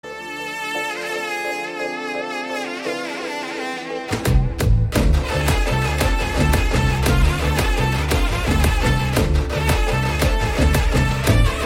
Sad BGM

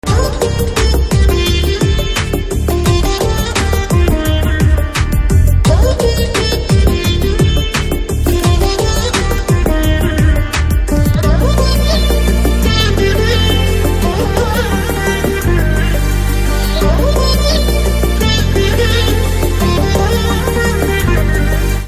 BGM Guitar Ringtone

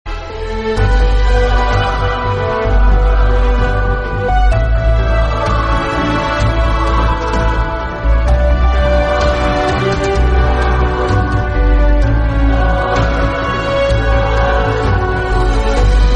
Haunted Theme Music Ringtone; Battlegrounds Mobile India

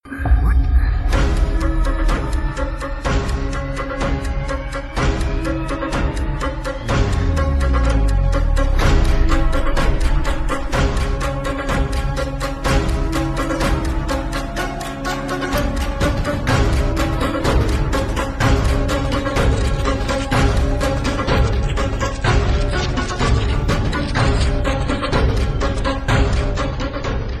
Countdown Start BGM; Chathur Mukham

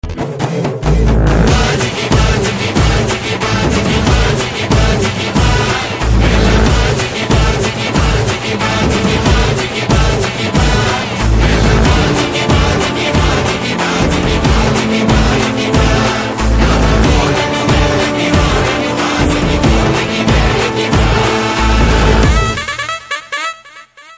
Karaoke

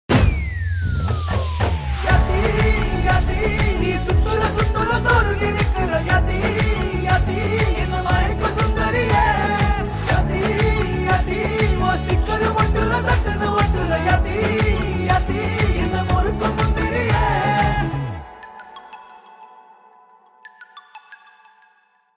instrumental

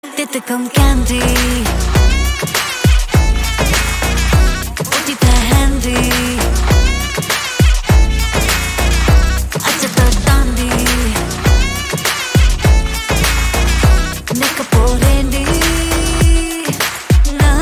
Indie Tamil BGM Ringtone

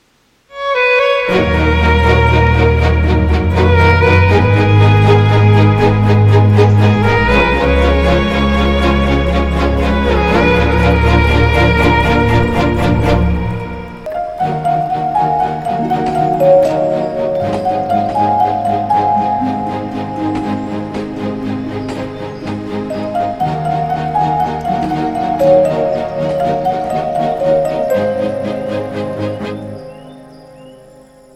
Heart Touching BGM

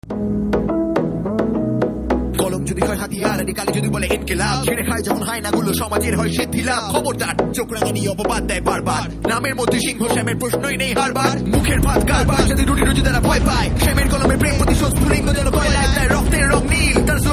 BGM Theme Ringtone; Shyam Singha Roy

